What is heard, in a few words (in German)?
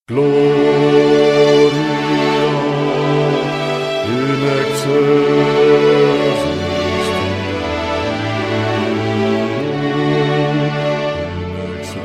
Motette Bass